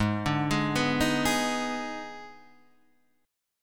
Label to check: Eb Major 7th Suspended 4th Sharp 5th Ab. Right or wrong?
Right